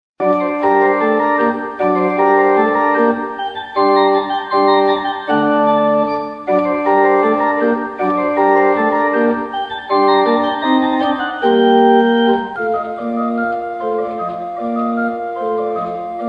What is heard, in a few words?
Gavotte in g moll; Camidge